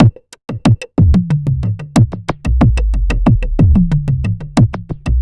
AFRO SYNTH; Distored Reality

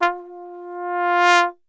F 1, Brass; Best Service; Brass Super Section

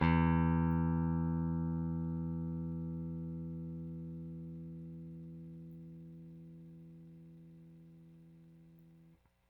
Rickenbacker Bass; Airwindows

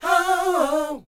SHOO BE, Vocal R&B Dance; Spectrasonics Vocal Planet

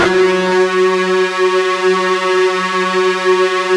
FUZZ F#2, Guitar; East West; Steve Stevens Guitar Samples Collection

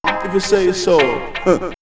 Jamaican Ahhhaa!, vox; vox mix; X-Static Goldmine

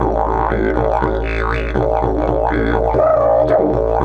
120, Didgeridoo

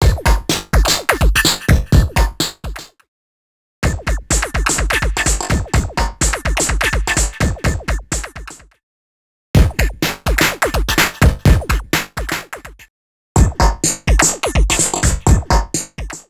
Electro 126bpm; X-Static Goldmine 5

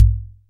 Kick, Clavia Micro Modular

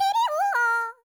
YODEL, World Voices

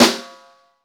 snare, Roland

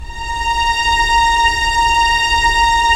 STR, Roland LA Composer

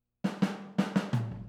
Boogaloo, Intro, Overhead, Drums, 82 BPM

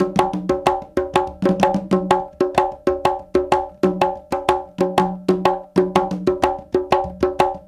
Bembe 6/8, World Dance

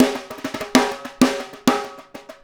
Snare Pattern, Drums; Essential Percussion

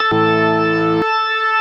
69 STOPS, Synth and Organ; InVision Interactive; Keith Emerson; The Most Dangerous Synth and Organ; ALL STOPS

